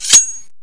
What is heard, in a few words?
knife deploy; cstrike; DEX